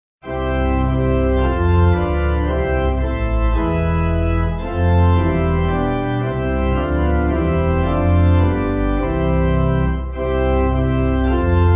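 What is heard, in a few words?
Pipe Organ, Music Backing Files for Churches; 5/C Simpler Timing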